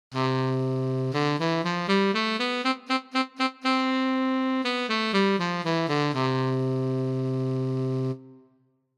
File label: Saxophone